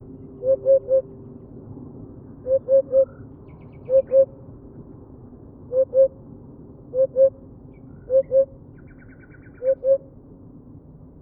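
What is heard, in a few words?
udod golos, hoopoe voice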